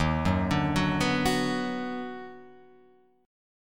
Eb Minor Major 7th Sharp 5th Eb down Guitar Open F, chord; EbmM7#5; arpeggiated; JGuitar